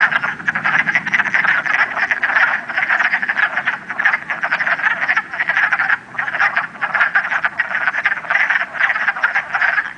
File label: wood frog shorter